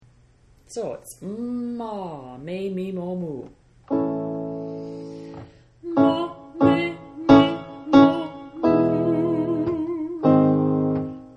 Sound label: MA MAY MEE MOH MOO